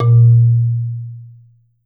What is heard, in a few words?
Marimba A#1; Sampleheads - Dave Samuels Marimba & Vibes